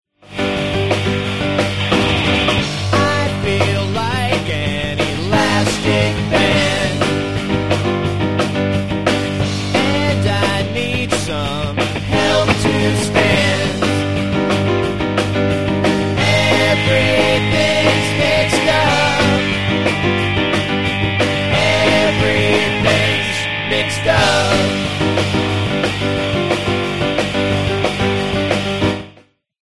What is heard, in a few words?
bells strings